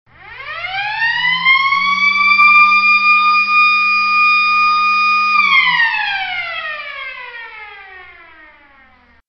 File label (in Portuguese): sirene eletromecanica; diponto dp300